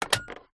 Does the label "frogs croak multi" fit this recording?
No